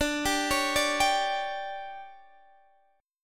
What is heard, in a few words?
D-Major 7th Suspended 4th, D, Guitar, Standard; DM7sus4; arpeggiated; x,17,17,18,15,15; down; capo=3